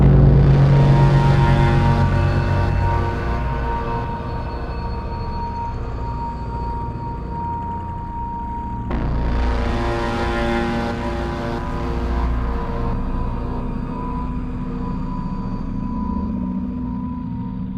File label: Fear Loop end; Face It A Game to Fight Inner Demons